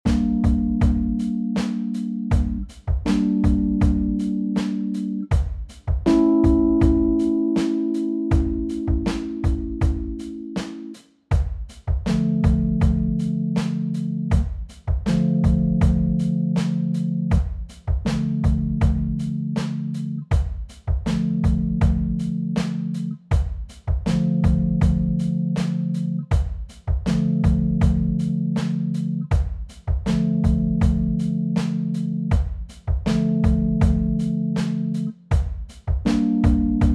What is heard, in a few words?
drums keyboard